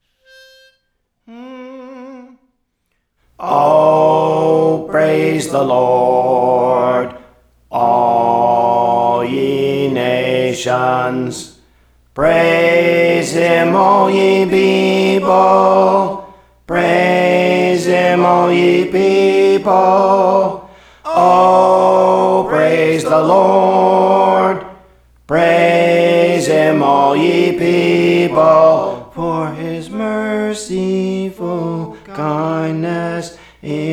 Alto, Songs; Praise For The Lord Training

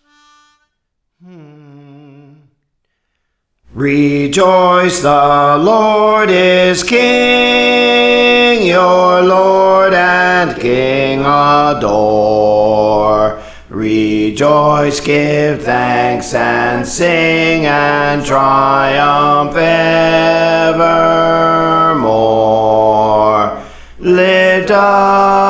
Bass; Praise For The Lord Training

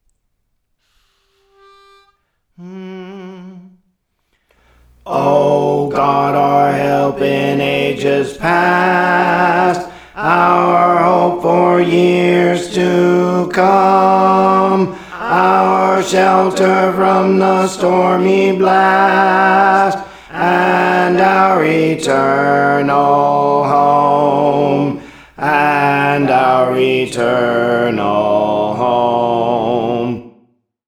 Chant Alto, Songs; Praise For The Lord Training; O God Our Help in Ages Past; Harveys Chant

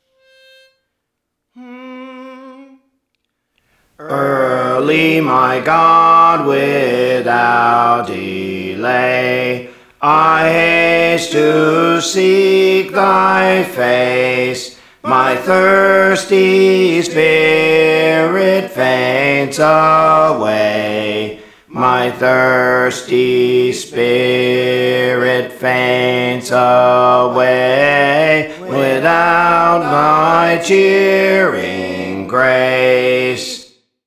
Bass; Praise For The Lord Training

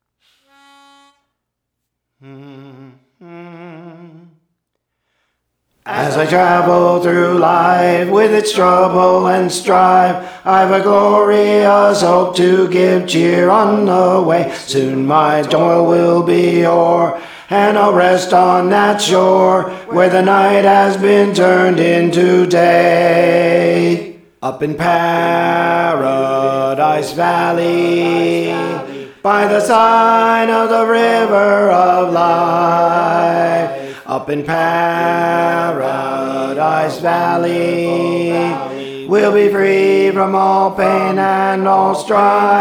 Alto, Praise For The Lord Training; Paradise Valley

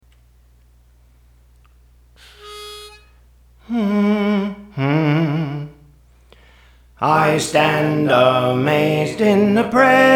Soprano; Praise For The Lord Training

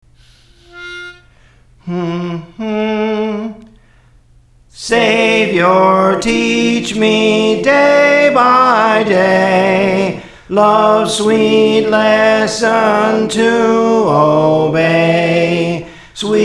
Soprano, Praise For The Lord Training

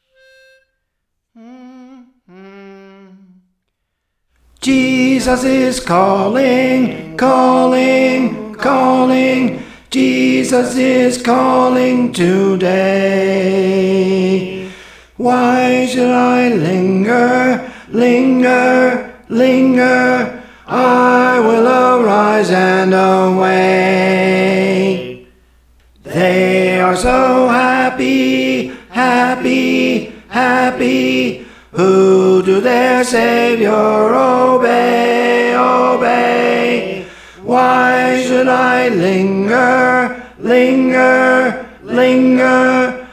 Tenor; Praise For The Lord Training

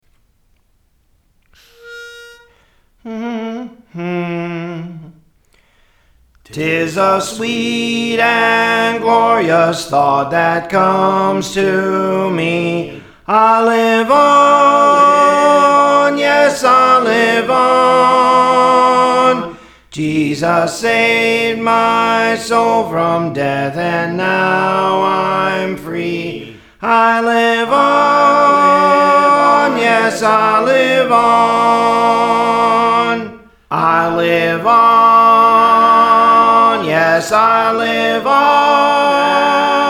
Soprano; Praise For The Lord Training

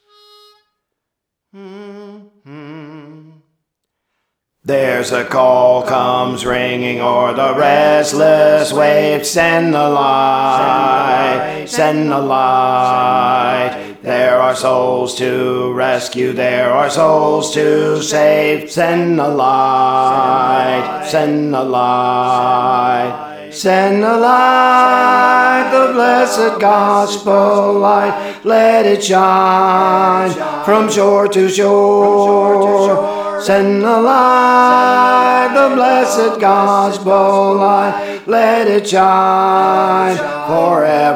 Alto, Praise For The Lord Training

